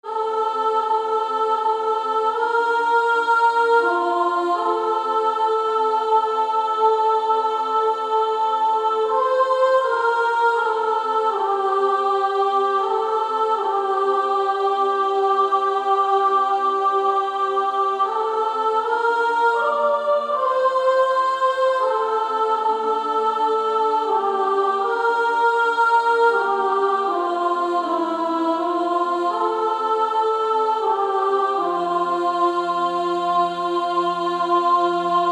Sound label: Female Choir; 2nd Soprano